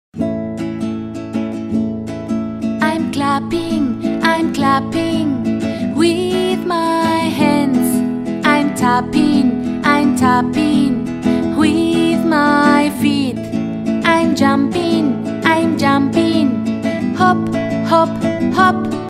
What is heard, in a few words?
I'm Clapping